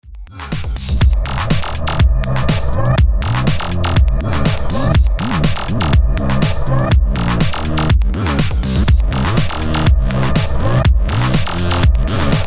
Akai house

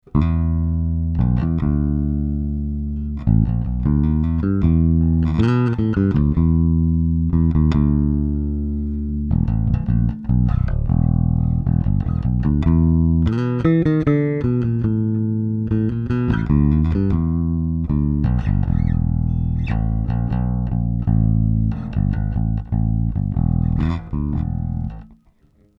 HB Block 800b Jbass himid

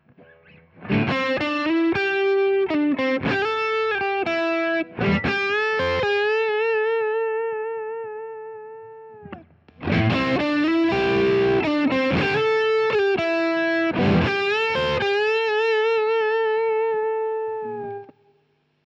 JHS Prestige Marshall Boost

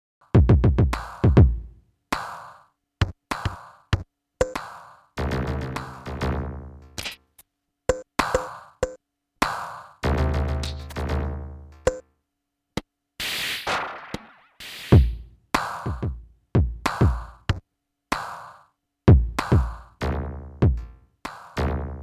Millenium Nona Pad Robotics

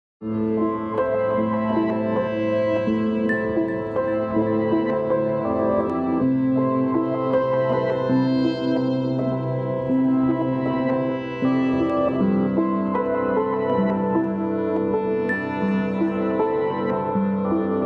Deluge Sound Nothing but the wind

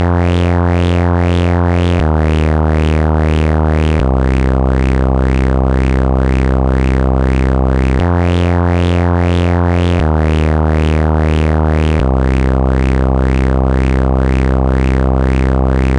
Filter FM

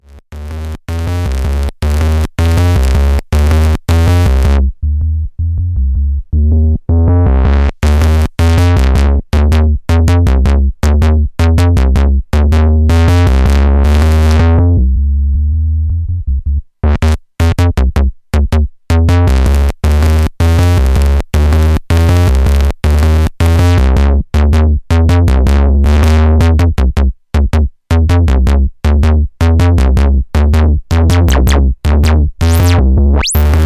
LP Filter; PlasmaVoice

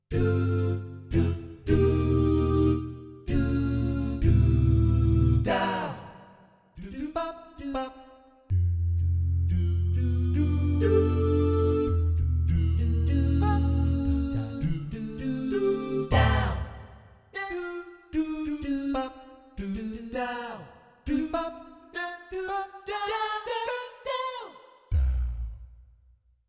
VA 7 Voices

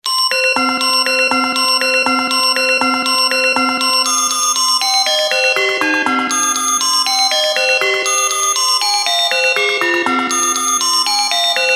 Singing Bowl Dry

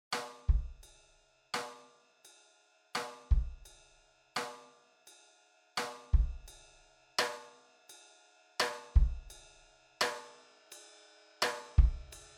AIR Strike BritPop Kit

